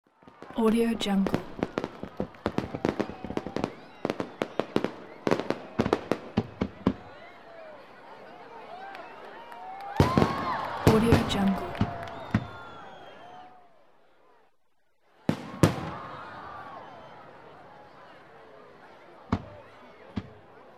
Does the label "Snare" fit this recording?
No